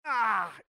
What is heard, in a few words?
death; priest